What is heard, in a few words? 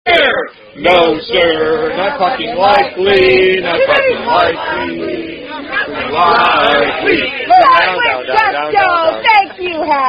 Sung; Would You Like A Finger In Your Rear